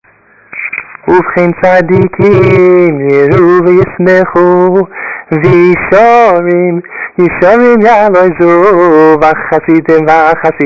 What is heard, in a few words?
Singing